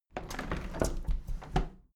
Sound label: window handle plastic squeak open tilt soft; objekte; fenster